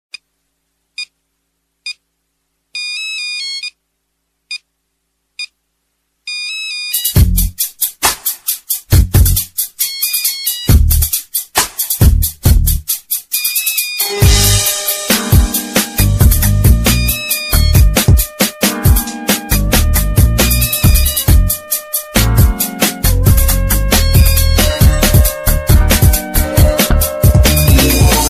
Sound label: nokia tic tic sms ringtone